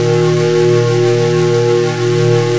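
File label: new charge loop, plasma weapon charge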